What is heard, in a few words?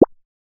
Footsteps Comical One